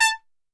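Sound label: A3 trumpet shot, Brass Super Section; Best Service; TRP SHOT; 90 s Sample CDs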